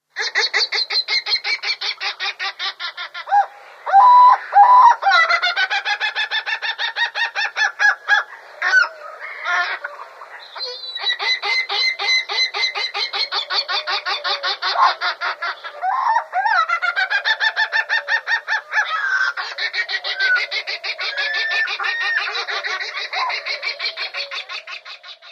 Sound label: White-eyed Gull